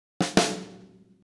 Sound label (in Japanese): 弱打はそのまま、強打部分だけ小さくなってます; CompressorX使用; スネア･ドラムの弱打と強打です。; 音量に大きな差があり、非常にミックスしにくい状態です。